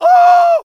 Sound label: SCREAM Male mono